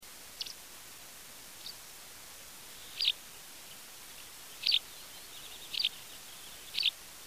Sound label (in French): bergeronnette grise